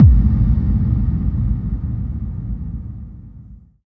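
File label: bass drum FX, dance drums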